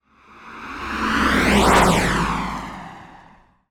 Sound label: vehicle car pass fast dry road L R; zapsplat